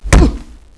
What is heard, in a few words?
man hit